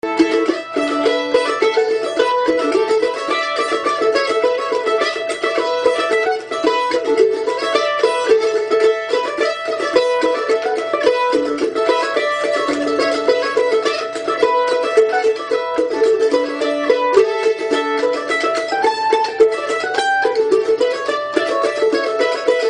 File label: Mando